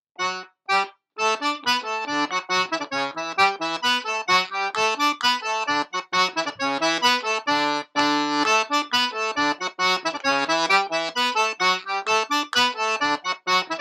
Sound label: The Gullane 130 bpm, Irish sean-nós dance; Polkas; advanced